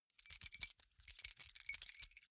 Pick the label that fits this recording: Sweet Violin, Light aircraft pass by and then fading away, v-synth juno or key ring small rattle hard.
key ring small rattle hard